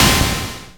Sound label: Snare c1, Moog mg-1